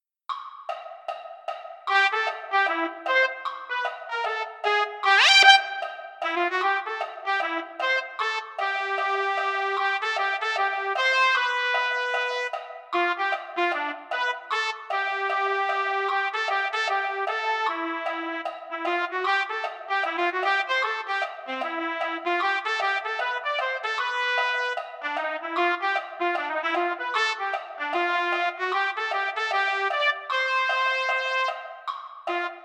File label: trumpet 1; holiday brass